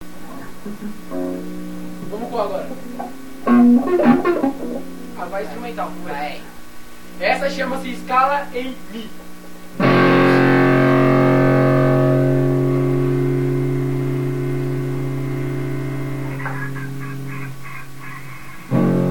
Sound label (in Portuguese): escala em mi